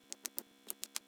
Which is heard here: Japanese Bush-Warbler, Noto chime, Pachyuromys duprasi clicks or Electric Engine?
Pachyuromys duprasi clicks